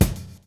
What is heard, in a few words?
groove; AMG Gota Yashiki Groove Activator